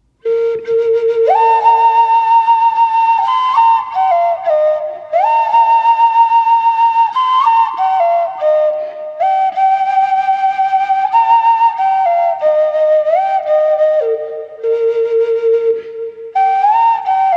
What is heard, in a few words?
Native American Flute